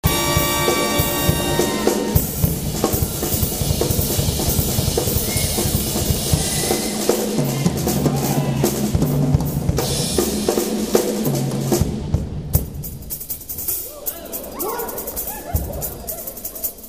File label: drum solo